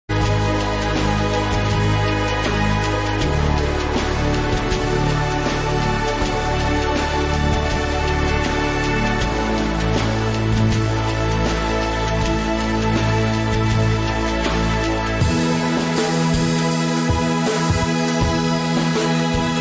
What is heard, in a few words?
ambient mix; Parallax